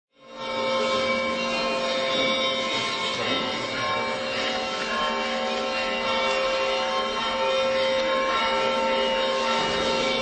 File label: BELLS